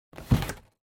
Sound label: Cardboard Box (Put Down); ダンボール; 置く; 梱包材